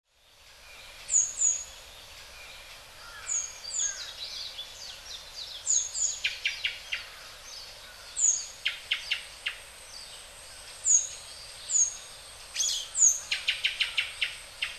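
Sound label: kurotsugumi, Japanese Thrush; hyogo; koe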